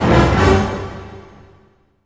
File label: HIT, full orchestra; Orchestral Loops; FULL ORCH ACNT